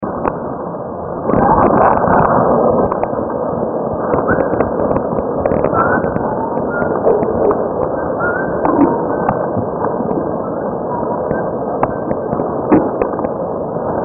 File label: whistling; green line